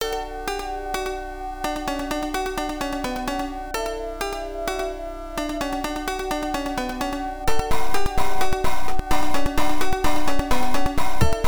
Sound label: Rains; Exile XZR; Genesis